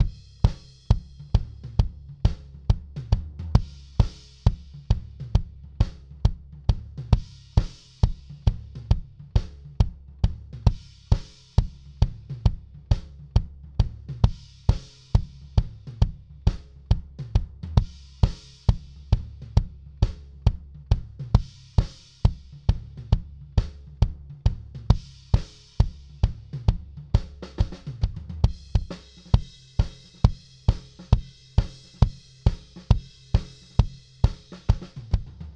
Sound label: Kick